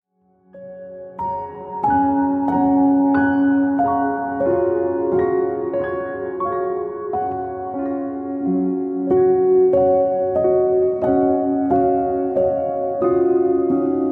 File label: Deep Meditation Piano